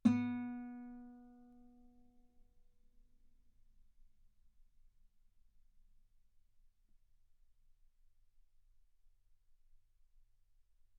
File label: Guitar B3; ff; sul B